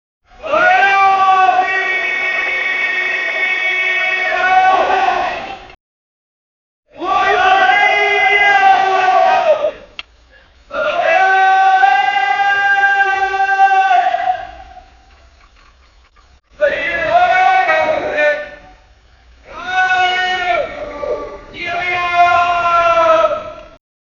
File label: the sounds of stone extra, mining